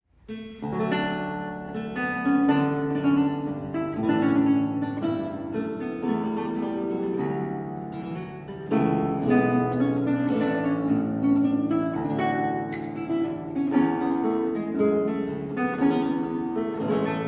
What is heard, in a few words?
Courante, Works for Theorbo; Musique pour le Roy Soleil; Robert de Visee